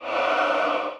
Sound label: Chorus Sing